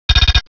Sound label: RATATTAT; OS2 Warp Connect; MMPM2